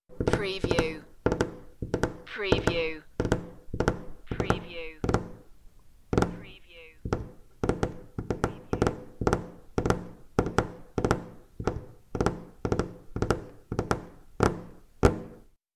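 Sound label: Finger Nails Tapping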